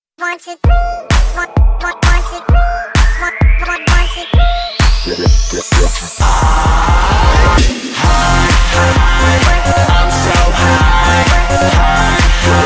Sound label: Ringtone